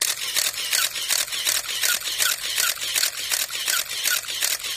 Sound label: 35mm Camera Auto Win; shutters & movement